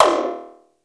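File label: plastic percussion; Crypton - DrumBase5000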